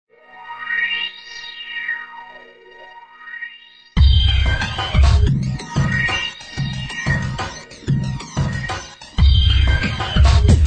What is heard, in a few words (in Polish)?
jingiel; crash